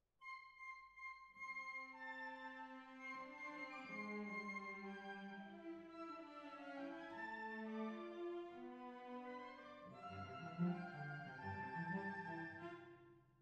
Cbasses

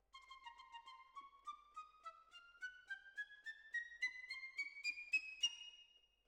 Violins, jazz for piano

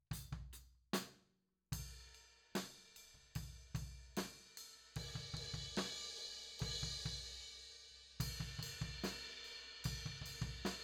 Floor

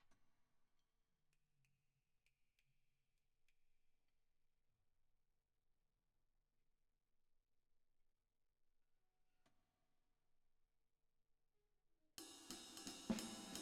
Drums Verb

